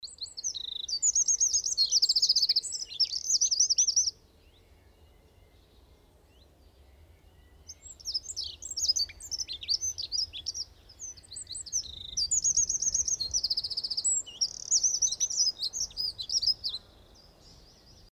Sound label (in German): Wiese Atmosphaere